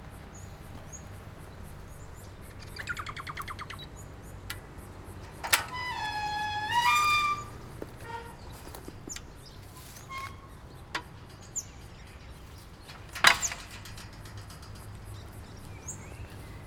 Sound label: Enter gate